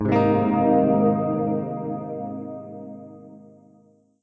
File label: g chord reverb; xmini-c3